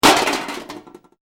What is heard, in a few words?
Metal Debris Impact Settle, Small metal junk crash; Tools, metal parts or pots & pans fall, impact, rattle and settle.; Debris Sound Effects